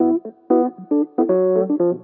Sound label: 117 soul jazz rhodes; KEYS ORGAN PIANO LOOPS